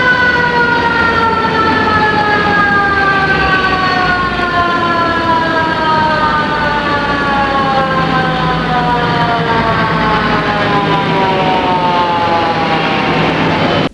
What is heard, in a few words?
AMBULANC; trasporti; soccorso